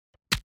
BOW hits

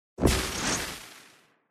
Thunder element

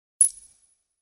UI moving option to one anoter

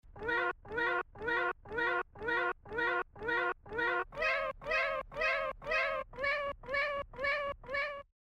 CHORUS

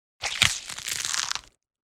bite

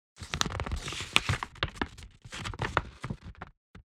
MOVMENTS paper

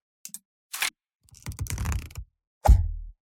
unleash arrow

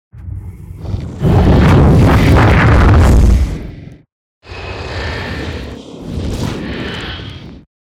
Fire element spell

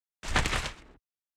Rolling

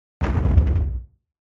huge footsteps

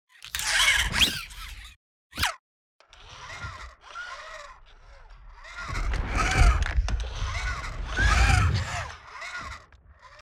ROBOT SMALL

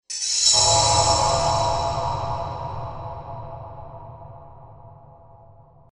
Horror

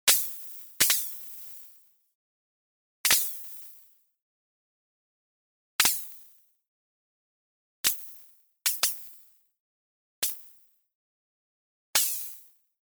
SHORT CIRCUIT

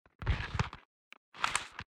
Ranger movement

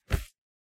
Cloth movment

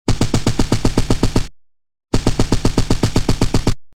Assault rifle

